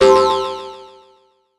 mad sad

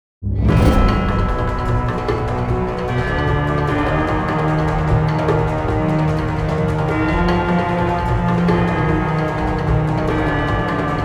ambiance impact event

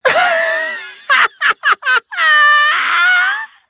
insane laughter; nagito

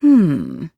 hmmm

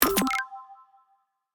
button click; va-11

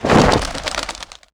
birds

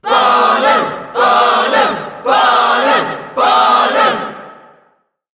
cheer

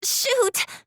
shoot; kaede

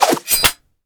sheath & unsheath

